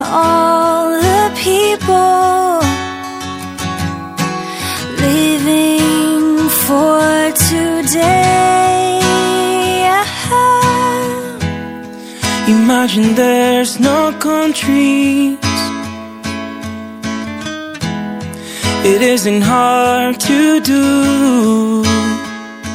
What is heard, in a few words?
spanish